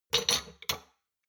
shot glasses grab multiple soft, gastronomie; berufe; soundpool

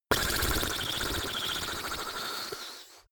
blow lips; koerpergeraeusche; menschen; soundpool

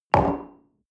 coffee place table medium, restaurant cafe

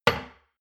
shot glass place table hard, gastronomie; berufe; soundpool

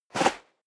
step high heels sand jump; schritte

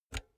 pull out xlr multi socket soft; objekte; elektronik

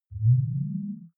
game start deep, signale

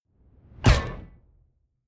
sci-fi ricochet metal; schusswaffen

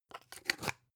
spice jar big scratch close slow; industrie wirtschaft; gastronomie

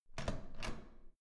door handle use

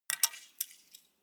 bow and arrow drop bounce; krieg-waffen; pfeil-bogen; soundpool

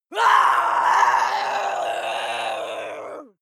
female lunatic scream hard; schreie; menschen

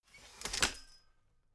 folding chair unfold medium; objekte; stuhl

